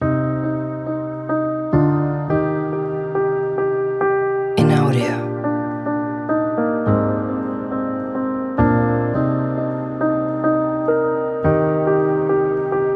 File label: Piano Ambient